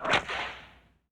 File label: Whip